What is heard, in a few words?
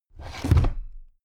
drawer cabinet crammed close heavy halfway